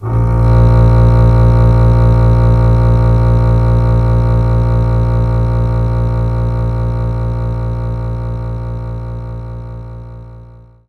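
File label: Double Bass A sharp 1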